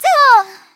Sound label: Attacked; Teto; SM64 CO-OP; Triple Baka Pack